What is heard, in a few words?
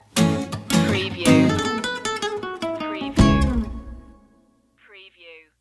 vibe stinger